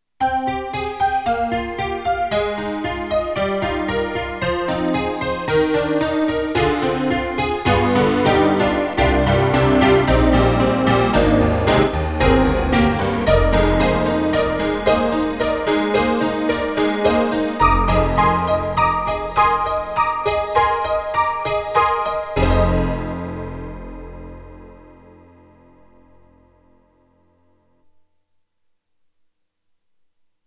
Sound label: CASIO GZ-50M HONKY-TONK